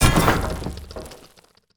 ballista hit building; ships siege

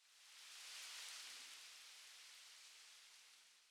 wind leaves, ambient weather

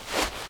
fs sand, singlesteps

